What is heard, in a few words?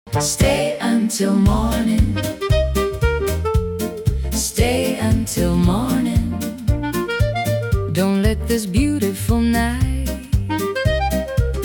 velvet voice blues vintage jazz blues